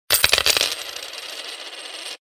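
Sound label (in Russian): monety na stole vertatsa, coins spinning on a table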